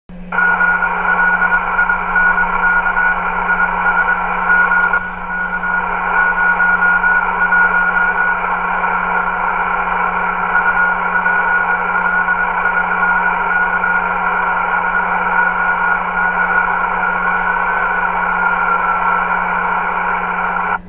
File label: CW